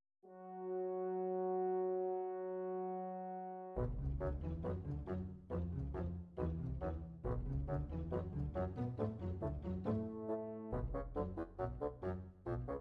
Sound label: classical, midi